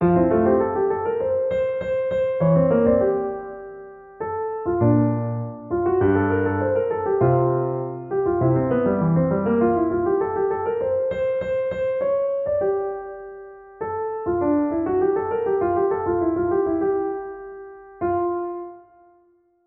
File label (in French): Rondo pour tous les instruments